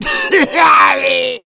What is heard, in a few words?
smoker spot prey; voice, idle